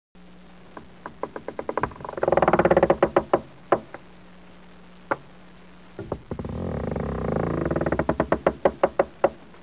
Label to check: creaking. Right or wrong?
Right